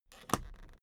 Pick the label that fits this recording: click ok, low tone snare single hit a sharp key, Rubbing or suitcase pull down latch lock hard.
suitcase pull down latch lock hard